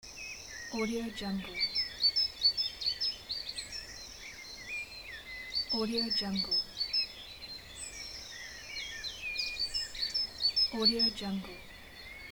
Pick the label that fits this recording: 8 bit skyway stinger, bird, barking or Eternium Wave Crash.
bird